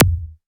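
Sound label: kick, ARP Odyssey Drums; Polyend; Simulacrum Sounds; 1 Shots; Synths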